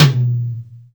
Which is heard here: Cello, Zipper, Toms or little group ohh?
Toms